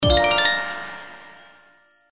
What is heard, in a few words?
Win Regular Symbol; slots; Valentine Blast